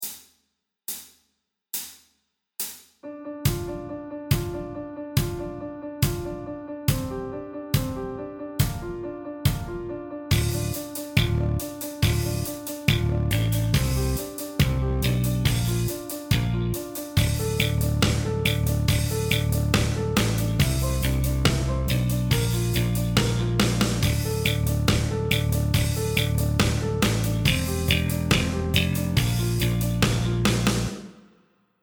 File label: D Minor Backing Track Slow; D Minor Damnation